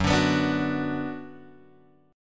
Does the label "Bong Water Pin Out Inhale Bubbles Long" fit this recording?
No